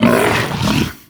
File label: pull grunt, barnacle creatures; Half Life Alyx